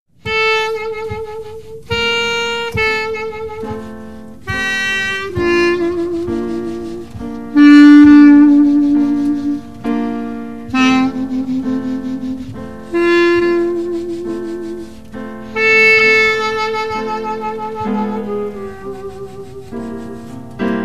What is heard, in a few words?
Quartet